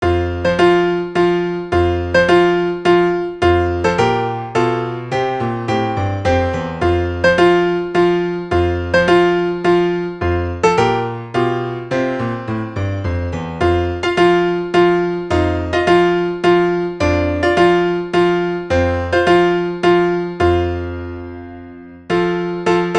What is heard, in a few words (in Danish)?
Allegro alle stemmer